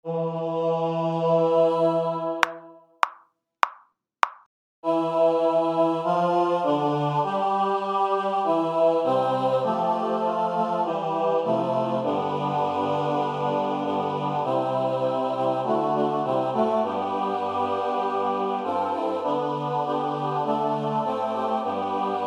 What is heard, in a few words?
full mix